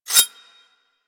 Whip Mech Rotate; Grineer

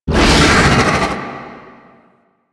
Attack Vocals; Ambience; Golden Maw; Warframe; The War Within

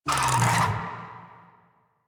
Infested Big Ashen Vocal Pain; Warframe

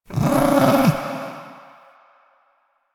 Ambient Meow; Warframe; Kalymos

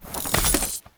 Sticky Bomb Unequip, Weapons; Grineer; Veilbreaker

